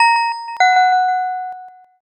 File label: pinpon bell